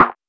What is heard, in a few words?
tight steel snare drum sound f key, snare tuned to the F note; snare drum samples